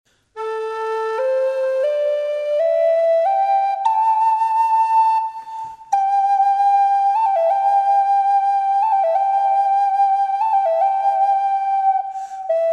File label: Cedar Classic